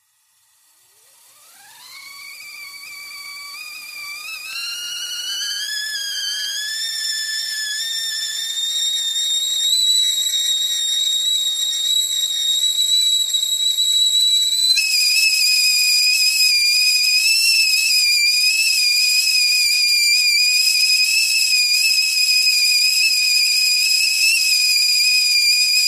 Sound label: Water kettle whistle; سوت کتری آب